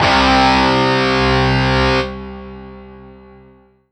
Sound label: Roland U-220 Unconvincing Guitar C4